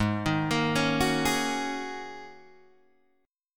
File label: G sharp 7th Suspended 2nd Suspended 4th G sharp Guitar Open D